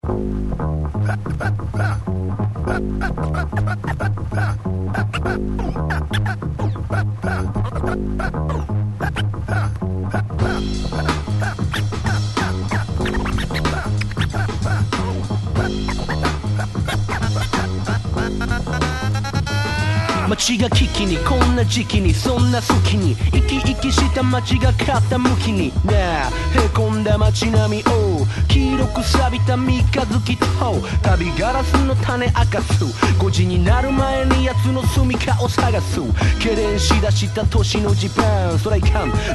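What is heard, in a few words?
hip hop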